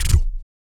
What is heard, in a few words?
BOOGAROLL, Vocal Blues Soul; Spectrasonics Vocal Planet